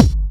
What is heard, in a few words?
Sub Perc, Bassdrums; Vengeance Samples